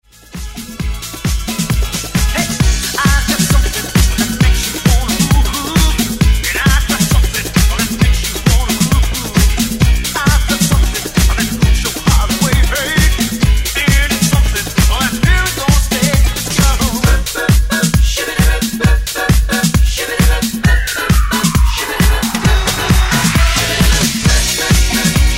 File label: Disco Break